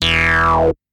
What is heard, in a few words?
notify